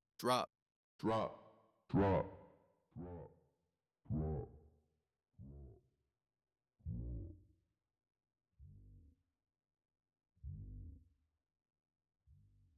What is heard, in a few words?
vocal drop down; hofa plugins